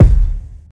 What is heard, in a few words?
low tone bass drum sample d sharp key; kick drum